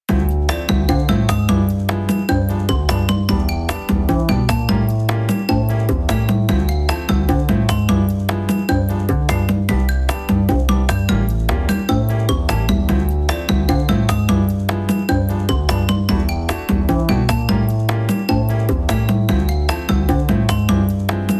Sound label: Lounge; Hanaby the Witch